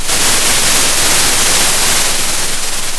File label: pop, Sound of a thunderstorm